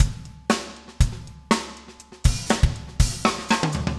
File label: funk, 120bpm, loops